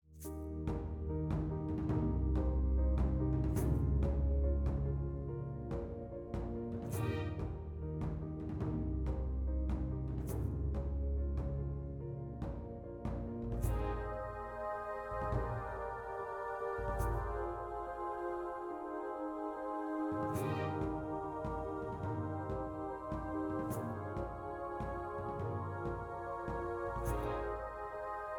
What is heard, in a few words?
Cinematic Uplifting Atmospheric; amperAI